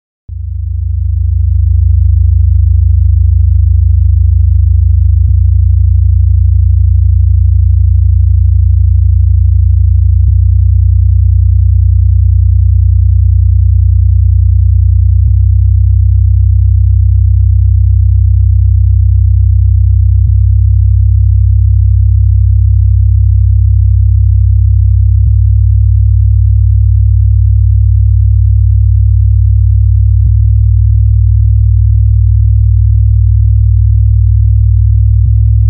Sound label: 64 hz sine wave 90 hz to 128 hz 5 sec each